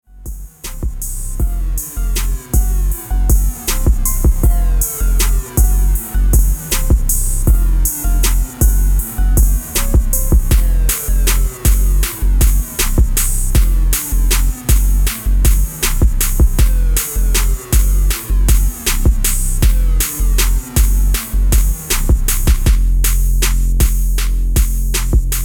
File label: Hardcore Sample